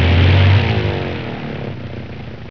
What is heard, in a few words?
engine; Master Clips Email Animator